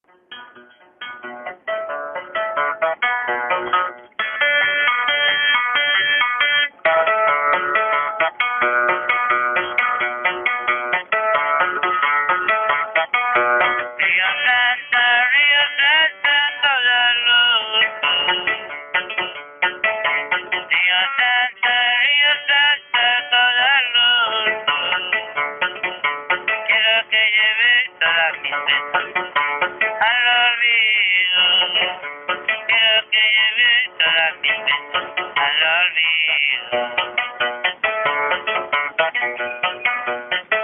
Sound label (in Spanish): guitarista